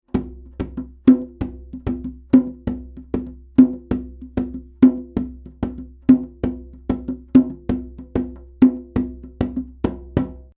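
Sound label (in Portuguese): DJEMBÊ; instrumentos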